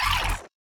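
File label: enemy Jumble Guts Waning Damage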